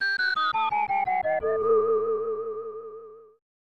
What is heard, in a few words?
Sneaky Trap; bs zelda; snes